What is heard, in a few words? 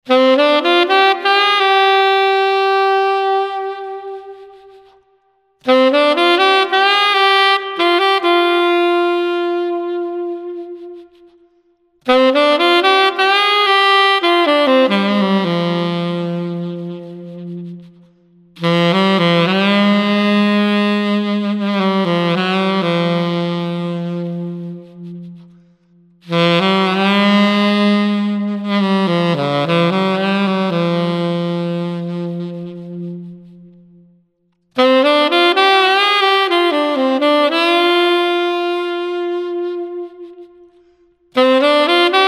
Street Lamp Sax